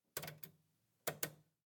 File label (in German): E-Herd schalten